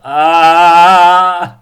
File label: Aaaaaa